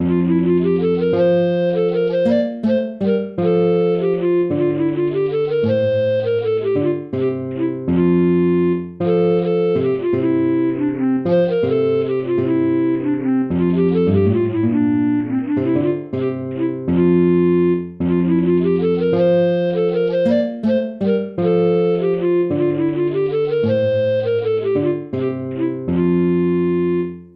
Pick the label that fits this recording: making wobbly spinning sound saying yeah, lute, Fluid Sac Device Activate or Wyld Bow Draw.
lute